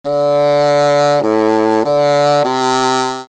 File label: Curtal